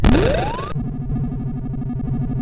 Die Alien Slime, CSG8580R5

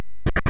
Musicbox, MOS6581R3; hvsc

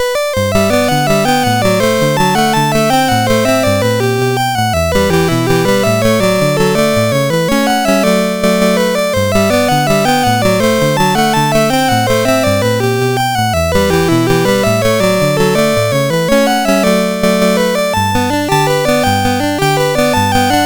MOS6581; MOS6581R4

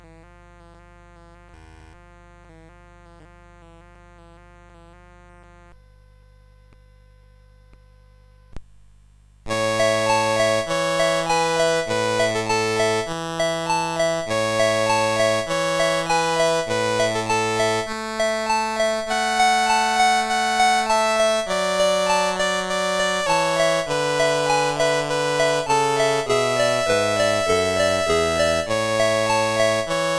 Panis Angelicus, CSG8580R5

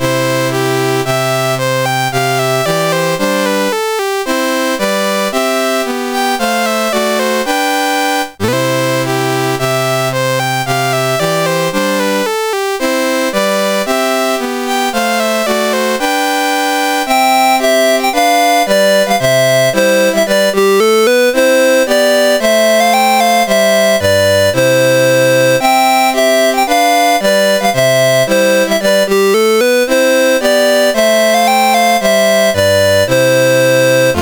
Spain, National Anthems, CSG 8580; CSG8580R5

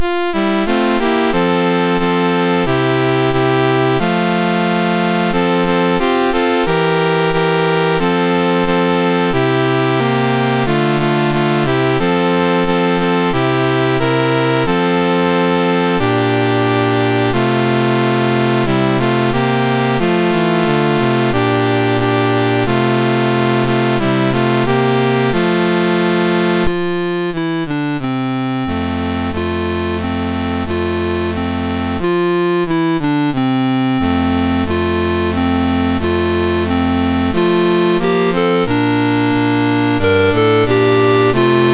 Tanzania, National Anthems, MOS 6581; MOS6581R3

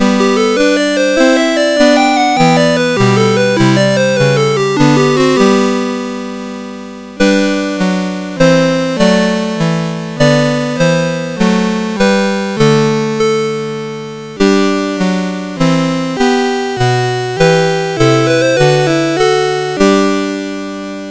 Jesu Joy of Mans Desiring, CSG8580R5

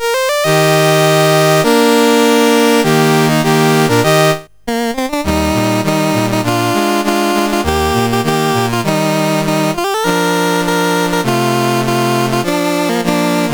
CSG8580R5, National Anthems; Nicaragua

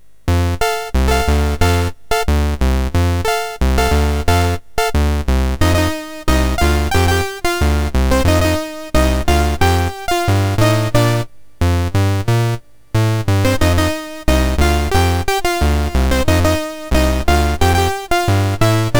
MOS6581; MOS6581R3

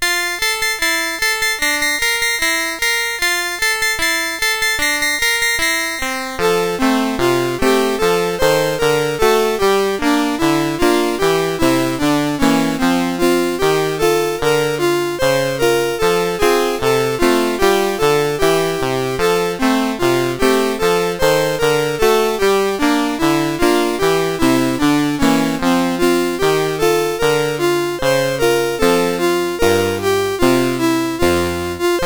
Christmas Carol, CSG8580; Bob Huffman

